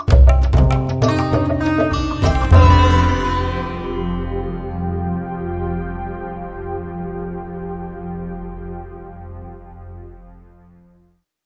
Weeping Raga, Hit

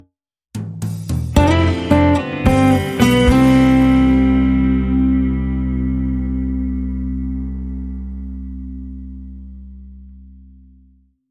Acoustic Harmonic End

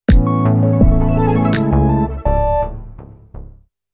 Bed and Bells Sting

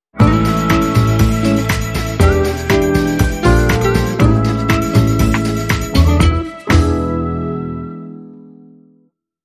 Intro Sting

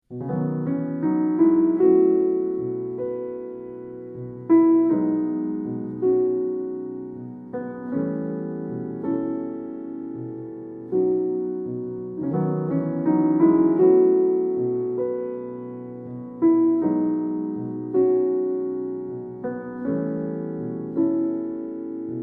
Solo Piano

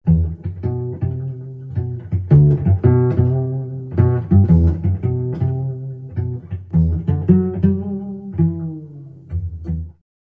Slow Pizzicato Blues

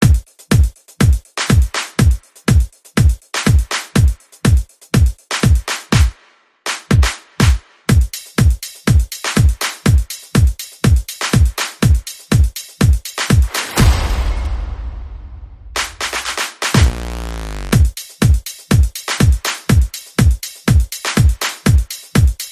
percussion and effects

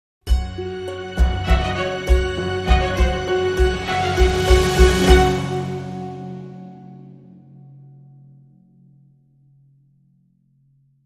Dramedy Sting, Short

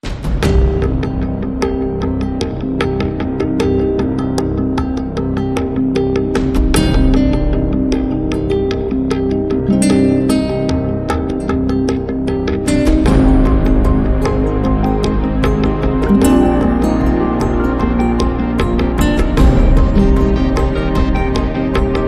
Cinematic

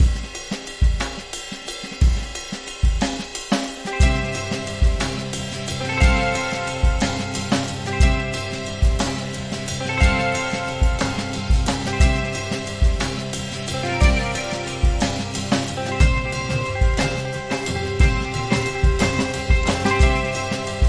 Simple Clean Guitar

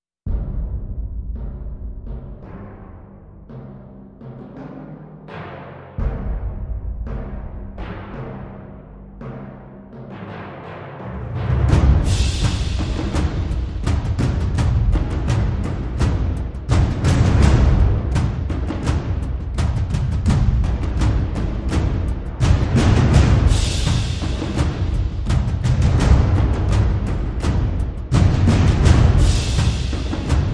Percussion Only

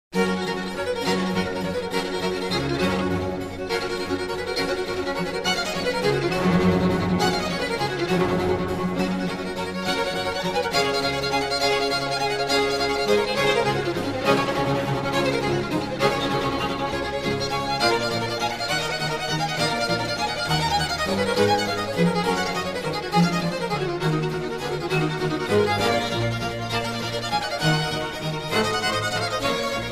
Concerto in G major for strings & bc; Alla Rustica; Antonio Vivaldi

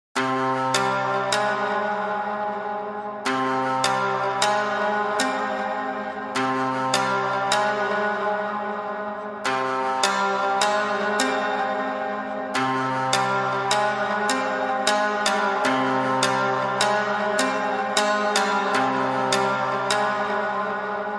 Shamisen Only

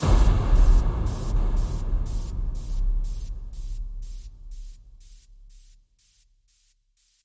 Noises

